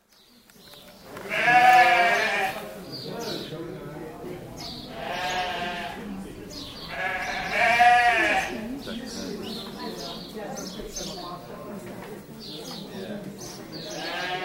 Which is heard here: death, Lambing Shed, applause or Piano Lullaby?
Lambing Shed